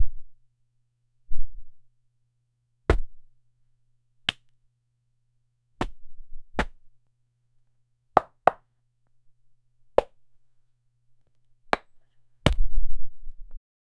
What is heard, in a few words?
hitting a person on the; impact